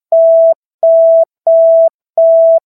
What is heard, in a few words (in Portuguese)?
sinal